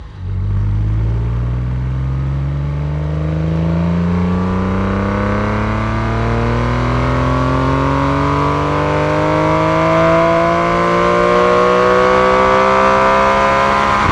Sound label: f6 accel, Vehicles